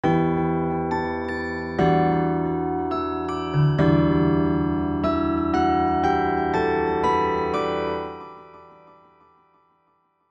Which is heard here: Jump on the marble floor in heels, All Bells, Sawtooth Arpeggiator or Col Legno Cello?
All Bells